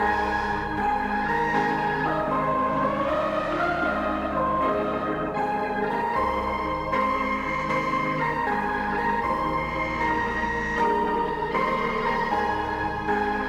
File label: mothercone loop; Blind Drive